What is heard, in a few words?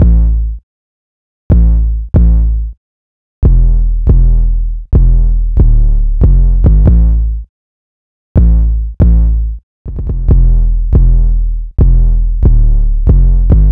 808 140BPM Am Short Thumpy